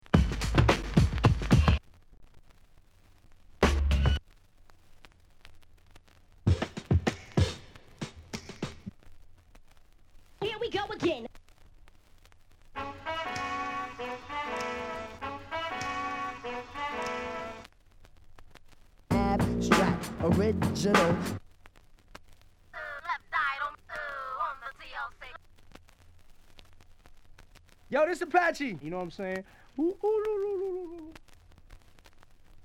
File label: samples scratches; wicked mix vol 024